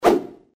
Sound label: Baseball Swing; LG GD900 Crystal; Game Sound